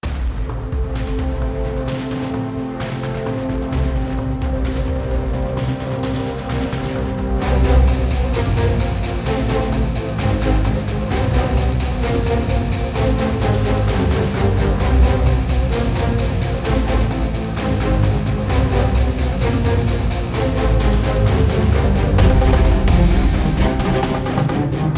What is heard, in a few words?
Rage (Background Score)